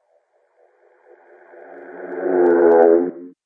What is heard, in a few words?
reverse glomp; Ze Franks Pain Pack; sweeps and fx